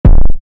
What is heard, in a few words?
mine lose; nuxt-games